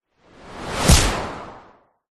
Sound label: sound design whoosh fast loud with a hard smack impact hit; zapsplat